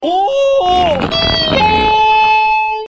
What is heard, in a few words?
Harmonica